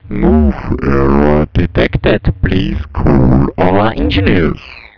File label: move error